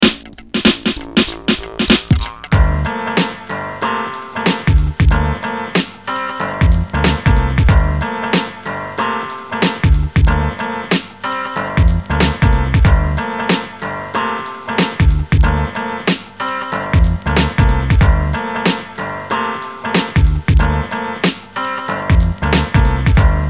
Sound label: hiphop